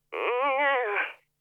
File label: Lock Chime, tesla